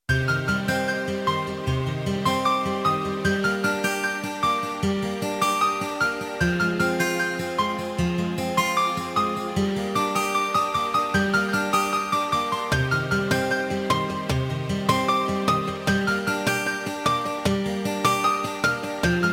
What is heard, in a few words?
Romance Piano